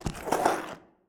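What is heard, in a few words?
Zombie Footsteps; Blind Survivor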